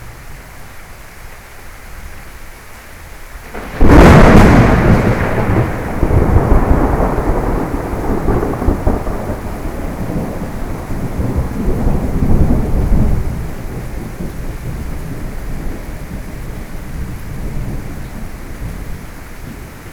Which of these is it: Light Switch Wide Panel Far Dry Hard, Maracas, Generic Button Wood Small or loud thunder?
loud thunder